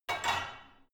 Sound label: barbell only replace, fitnessstudio